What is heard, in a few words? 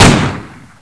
gun; wartso